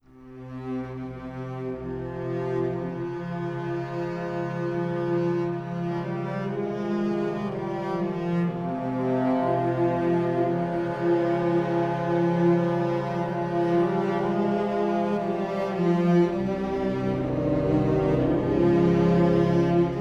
Mission Failed; Xbox

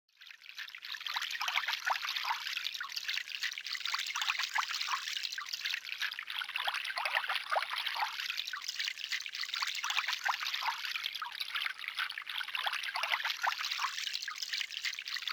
Ambient Stream

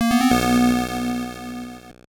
Level Start; prince of persia; game gear

